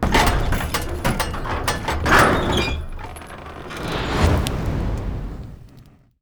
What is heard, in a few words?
catapult Reload; Kethotum